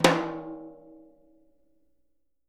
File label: Living Drums, JZ XTOM 1